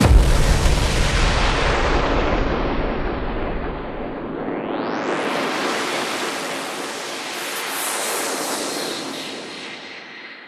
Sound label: FX Kicks